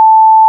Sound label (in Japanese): 880Hz, ラジオの時報の「ぽーん」という音の高さです。